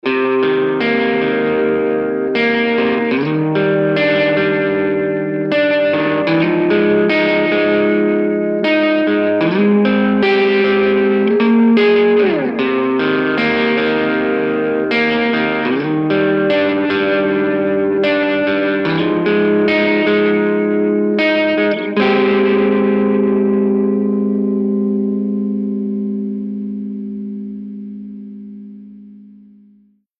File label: guitar reamped